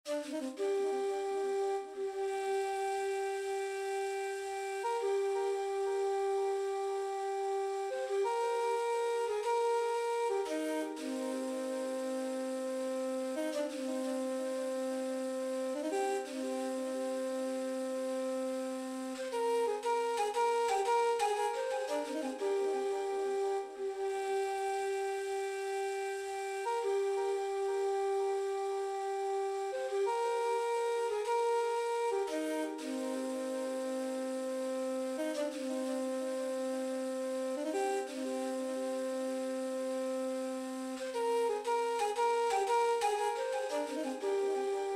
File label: flute melody